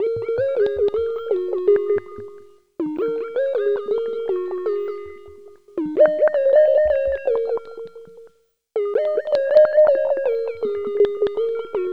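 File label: synth, Drum & Bass; Best Service ProSamples vol.03 - Drum & Bass 1; AKAI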